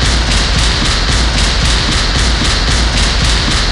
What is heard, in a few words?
Teeth Hits Loop; Hub